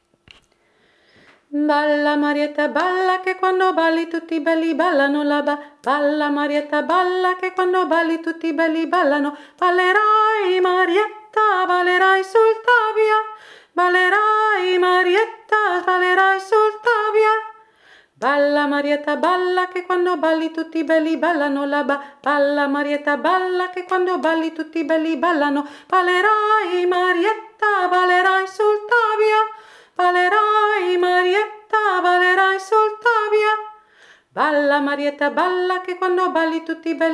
marietta 1 stimme

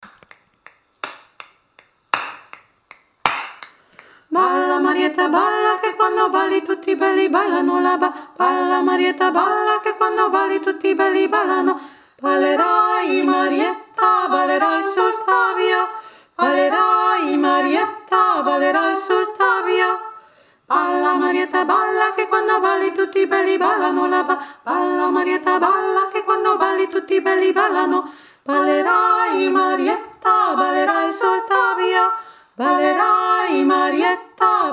zweistimmig schnell

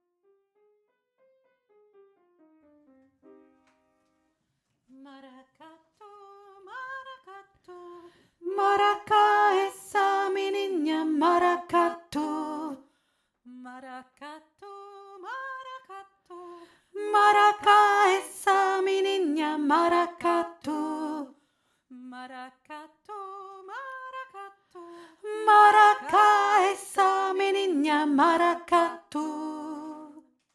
mara ka tu mittlere stimme

